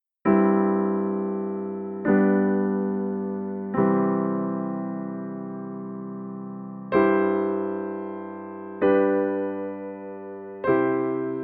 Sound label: Cadential 6 4 3 melodic forms